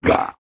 monster, animals, monsters

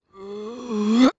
swordman die, haaaaaa; voices; death

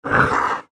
monster, animals, monsters

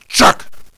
warrior attack; megaglest

